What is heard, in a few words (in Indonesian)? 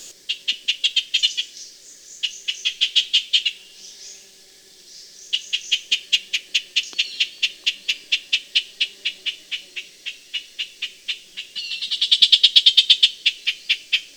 Suara Asli Burung Kapas Tembak di Alam Liar Cocok untuk Masteran